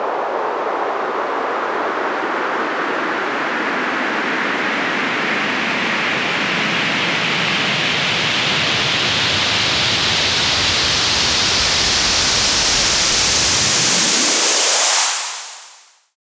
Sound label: Medium Sweep, White Noise